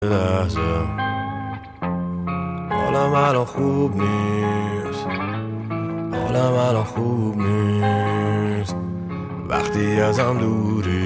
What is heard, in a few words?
Acoustic Version